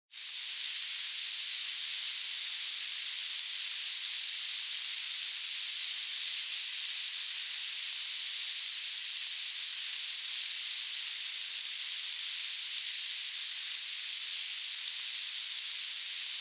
Hourglass 1 Minute; タイマー機器

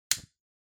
Lighter Click

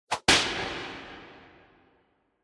Hit Slap Short Reverb

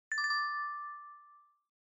Smartphone UI Sound Speaker

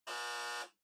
Flip Clock Far Buzzer 60Hz

